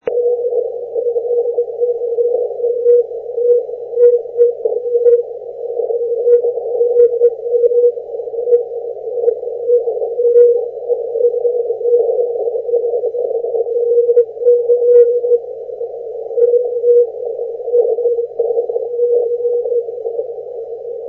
80m cw, Listen to this very strong "multi-path signal distortion; DF2PY